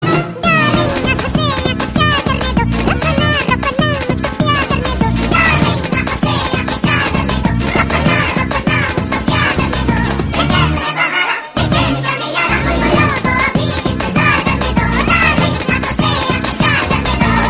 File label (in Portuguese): Bebê cantando acelerado